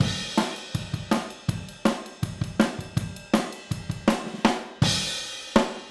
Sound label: Overhead ST, Drums, 162 BPM; Take Your Toms Off